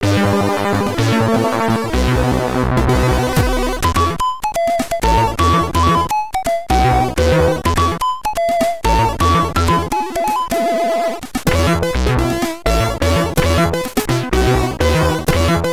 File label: Ending (SID Stereo), commodore 64; Back to the Future III